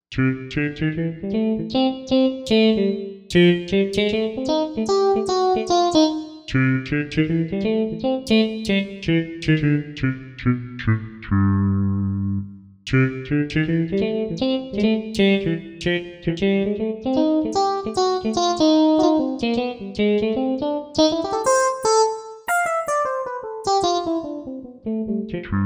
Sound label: Voice; Factory Patches